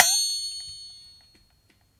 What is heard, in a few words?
FLEXITONE, DUB FX; Drumdrops In Dub VOL-1; SINGLE HITS